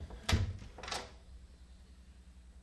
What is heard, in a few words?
Door Open Clunk On La, latches; latches & bolts